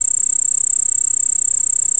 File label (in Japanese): higeshirosuzu, insects